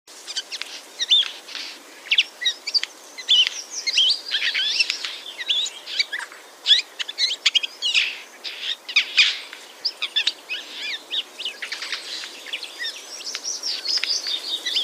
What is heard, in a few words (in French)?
Geai des chênes